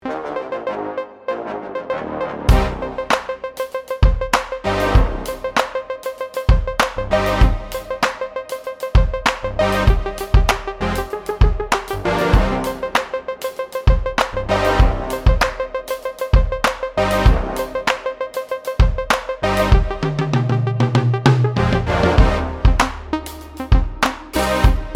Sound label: hip hop